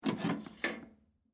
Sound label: Tool Box Pick Up, Industry, Tool, Box, Pick, Up, Metal, Case, Antique; Hand Tool Sound Effects; BlastWave